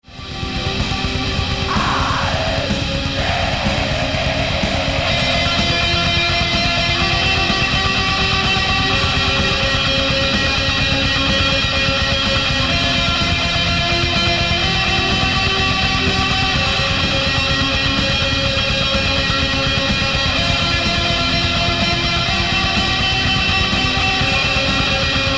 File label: guitar